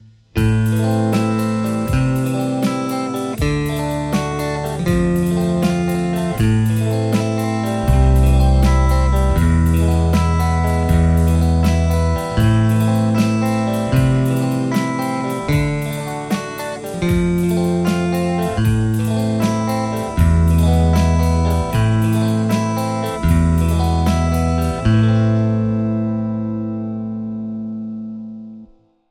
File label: strat harlequin; mercuriall